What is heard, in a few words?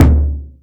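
Boss DR-202 percussion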